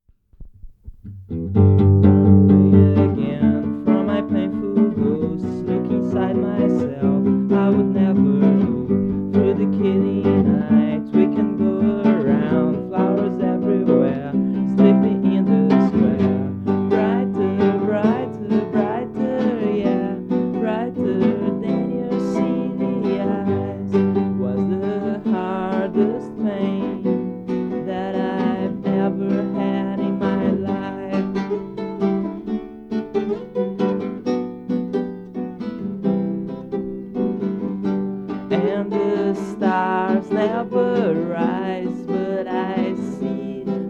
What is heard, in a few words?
acoustic